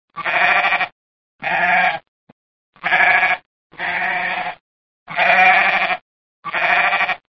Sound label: goat chorus